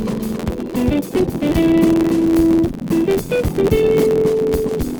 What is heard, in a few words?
Latino